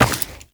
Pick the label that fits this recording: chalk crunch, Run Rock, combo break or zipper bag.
Run Rock